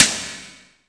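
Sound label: snare drum; AKAI S6000 CD-ROM - Volume 1; DRUM RYTHM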